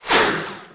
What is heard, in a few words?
retaliate target; weapons; dota; centaur